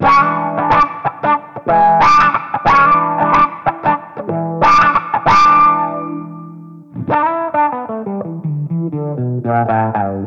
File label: Clean Attack